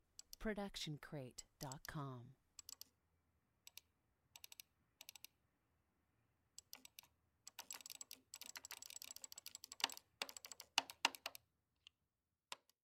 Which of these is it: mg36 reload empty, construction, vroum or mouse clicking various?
mouse clicking various